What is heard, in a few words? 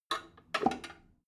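drain valve close heavy, bathroom; haus garten; badezimmer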